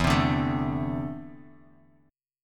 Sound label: E 7th Suspended 4th Sharp 5th; E7sus4#5 strummed; 12,15,12,14; JGuitar